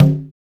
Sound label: LINN CGA LO, congas; Drums In Your FACE!